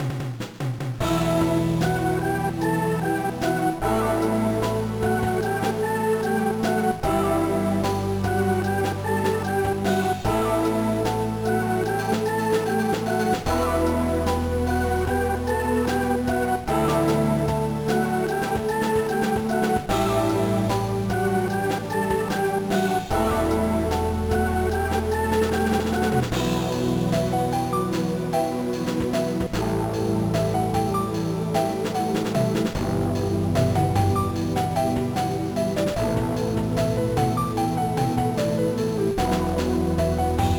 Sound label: BGM; konjiki no gashbell makai no bookmark 2004 gba